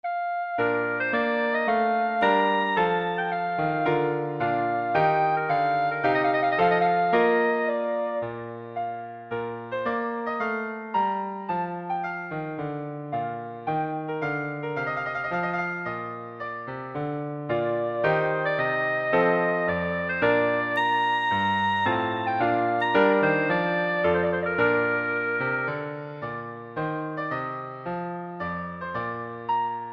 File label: trumpet tune; greene